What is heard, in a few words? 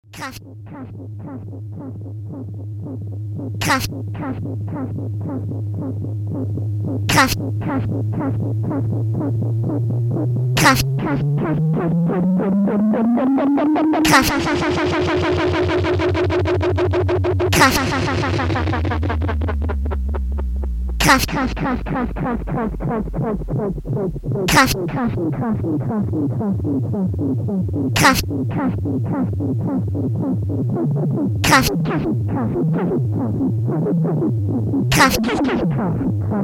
delay, vte1600